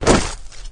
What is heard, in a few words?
jump up, flood